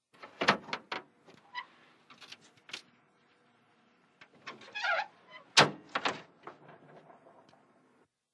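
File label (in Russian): звук люка танка; Открывание и закрывание люка танка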